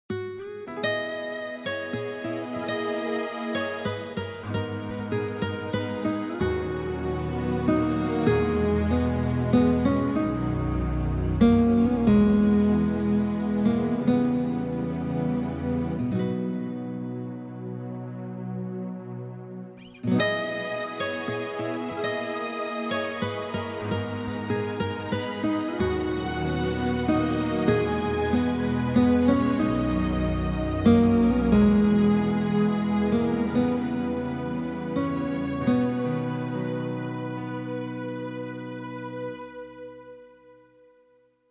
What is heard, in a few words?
Serenade